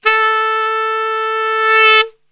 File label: A 2 SAX; Best Service; Brass Super Section